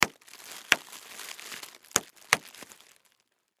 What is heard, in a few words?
Bubble Wrap Pop, Bubble Wrap Pop Multiple; Foley; Blastwave